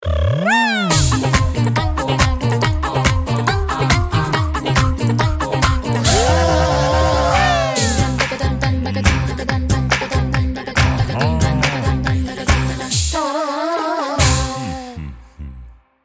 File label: Break Dance